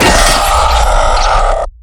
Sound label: battered box halfway